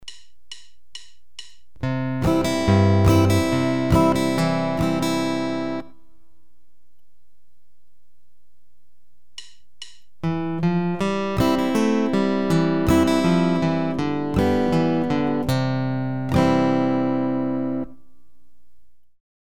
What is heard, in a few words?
Thumb-brush-up and Carter style patterns, guitar